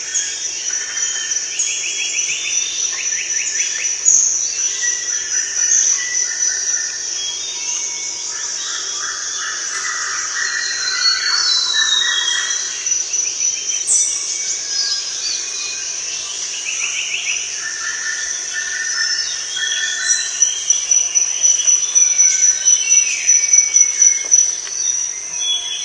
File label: Jungle; Time Tenshi 2